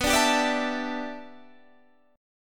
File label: G 6th Add 9th B, chord